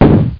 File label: Muffled POP; DR BRAIN; BUGS